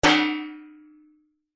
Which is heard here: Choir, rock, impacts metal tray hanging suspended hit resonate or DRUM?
impacts metal tray hanging suspended hit resonate